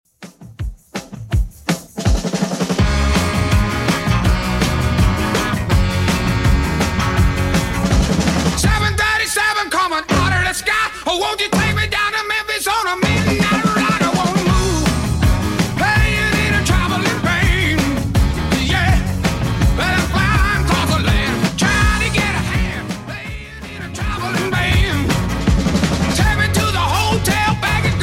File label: 70s Redrum 164bpm